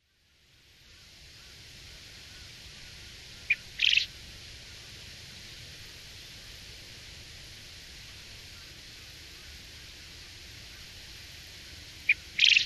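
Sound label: Japanese Quail; kitahiroshima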